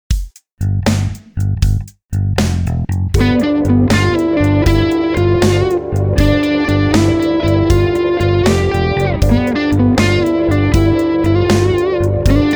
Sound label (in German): Gitarrensound fetter machen Lick